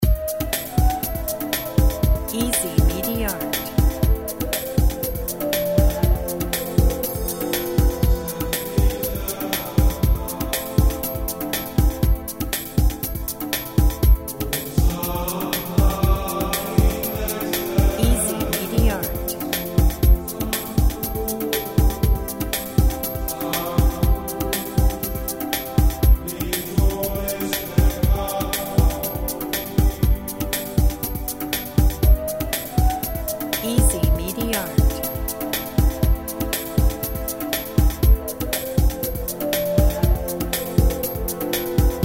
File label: welcoming east